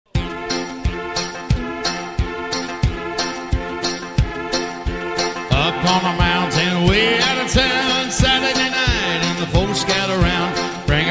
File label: Country Hillbilly Rock